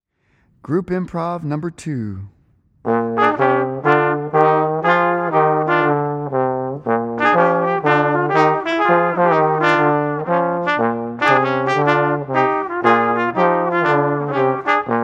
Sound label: group improv